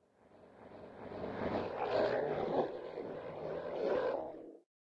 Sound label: forest, lake